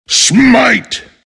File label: attack; Omniknight